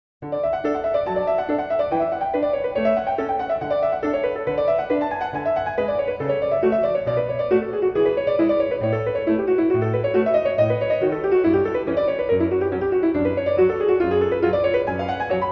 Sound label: Prelude in D Major; BWV 850; BACH; WTC I